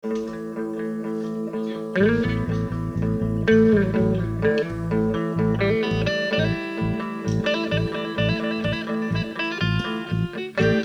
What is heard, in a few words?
THE RHYTHM METHOD (Level-Test Improv)